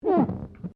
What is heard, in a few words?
GLASS SQUEAK FINGER